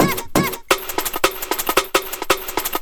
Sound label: Break, Loops; M8